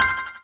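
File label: Ambience Partymode Crowd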